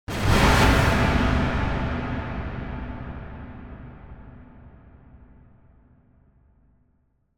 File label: Impact Distant Dull Hit - Bad Surprise Strike; Hollywood Edge; sound designer's toolkit v2